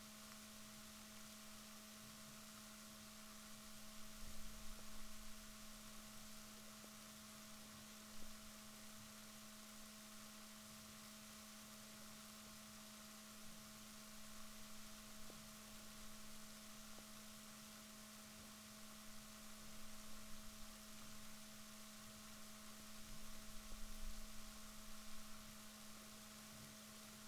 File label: rorquals negatif, Balaenoptera Physalus Chile